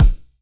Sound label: Floor Heavy Foot, Kicks; Airwindows